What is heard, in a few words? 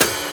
ride splash, Drums, One Shots; Slate; AURORA Sample Pack